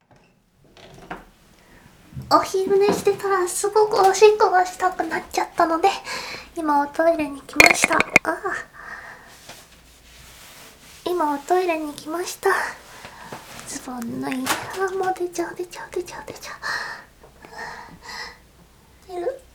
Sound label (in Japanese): コーヒー飲んでからおしっこしちゃうの